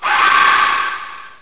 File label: scream; Hexen2; skullwiz